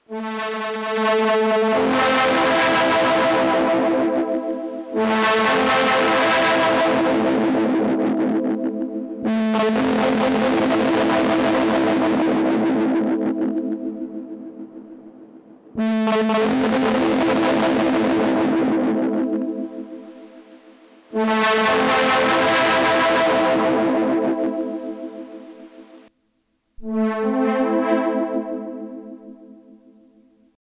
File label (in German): Death by Audio Octave Clang Synth Clean bis Fuzz